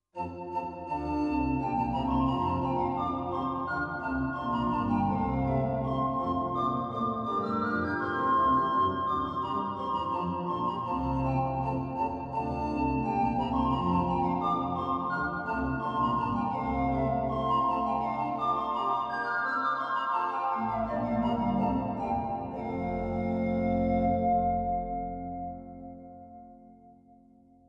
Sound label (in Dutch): panfluit solo stem 6db; Johannus Studio Etude